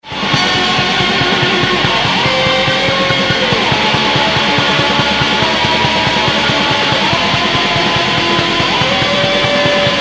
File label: solo